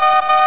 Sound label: collide; betweenworld